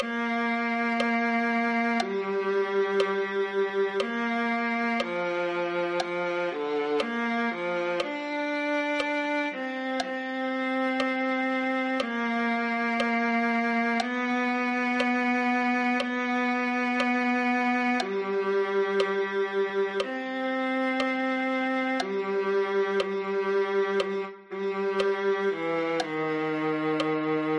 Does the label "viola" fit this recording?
Yes